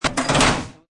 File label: Close Broiler, Closing a broiler.; Food Prep; Restaurants